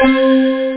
D50 sound

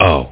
pain; Duke Nukem 3D Kill-A-Ton Collection

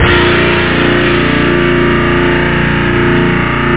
QUITAR

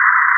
Engine; PC Gamer IT CD 48; Big bang

